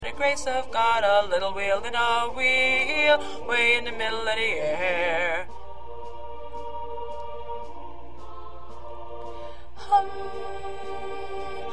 Solo Baritone

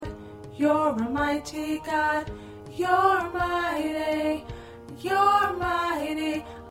Alto, choir parts

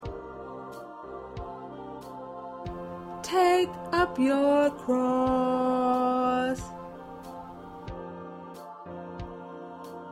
Backup Tenor

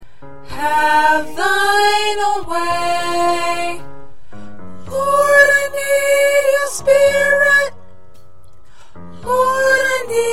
Soprano

